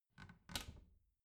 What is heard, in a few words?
bathroom cabinet door creak close soft